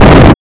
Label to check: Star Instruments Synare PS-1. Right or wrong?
Right